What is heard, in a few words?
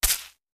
Hit Light Forest; wild worlds